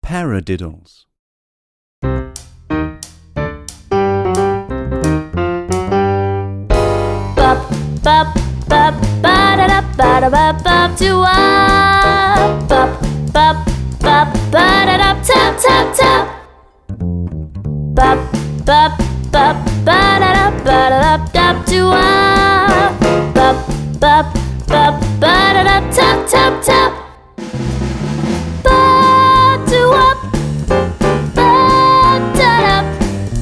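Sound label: Paradiddles, Grade 1 Tap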